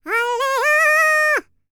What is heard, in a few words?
MACEDONIAN, World Voices